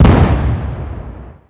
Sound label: small explosion